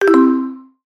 Bodies Collide Absorb

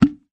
launch; projectile data lab

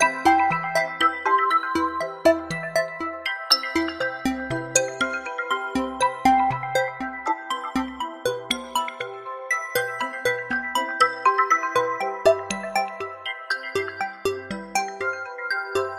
High Tones Ambient; quad Track

